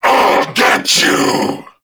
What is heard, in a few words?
Vocal Player Spotted; Warframe; Duviri; Dax